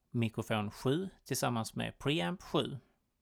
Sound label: mic pre